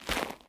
zombie footstep; DeadFright

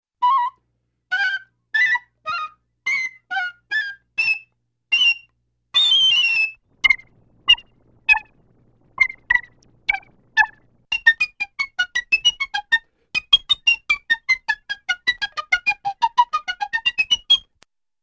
Piccolo Playing Various Short Trills; Cartoon; Hollywood Edge; Cartoon Trax

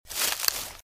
Footsteps - Bush walk - Right; Eden; RadwinIsland

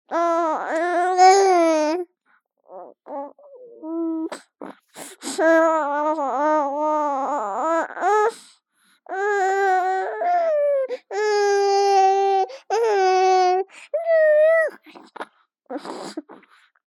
BABIES WHINE Boy 3 months Reedy; Baby Boom

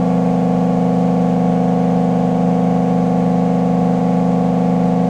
Truck Ford 250 Loop; Trucks

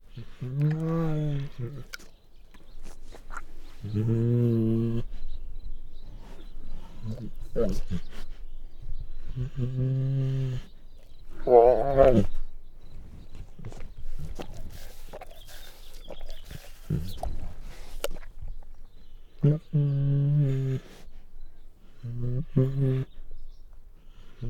Male Bengal Tiger Growls and Snarls; Tigers; Sound Ideas

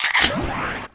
Gun Reload Scifi, Weapons Foley; SFX Kit

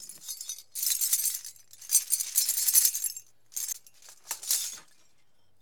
Percussion Native Shaker; SFX Kit; Foley - Percussion

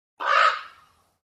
Raven; Inquisitor 3

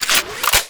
Gun Draw Select Weapon, Weapons Foley; SFX Kit

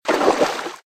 Footsteps - Water - Shin Level; RadwinIsland

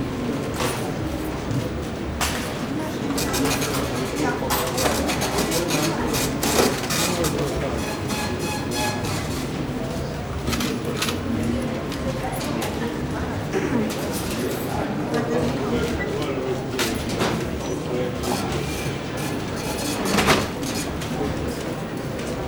market cash desk; best service Blue Box

